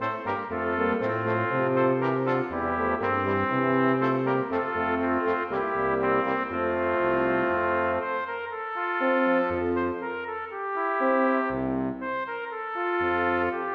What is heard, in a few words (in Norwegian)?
Allemand for messingkvintett; Bang